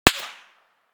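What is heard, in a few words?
concrete distant; Escape from Tarkov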